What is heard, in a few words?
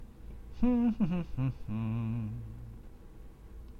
hmmm